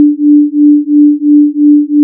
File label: wav beat